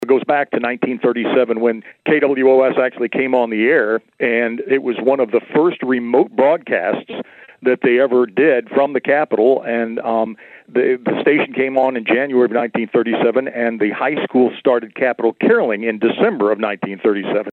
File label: caroling; Capitol